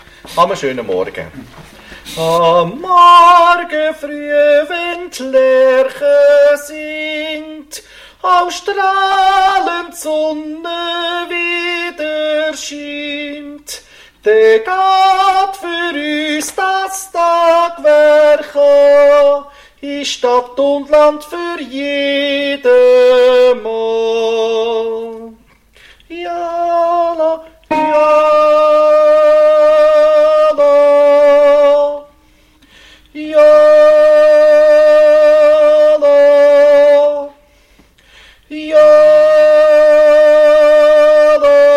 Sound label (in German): 1. Tenor